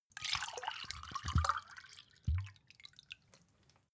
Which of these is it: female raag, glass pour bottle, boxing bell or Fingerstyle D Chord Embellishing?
glass pour bottle